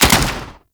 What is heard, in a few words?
SMG Alt Fire; Warframe; Tenno; Sundial SMG; Weapons